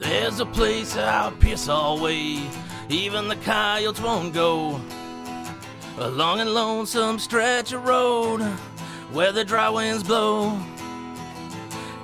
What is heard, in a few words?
Male Vocals Ballad